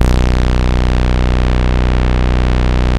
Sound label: Bass Cuts; Vengeance Samples; VES1 Instruments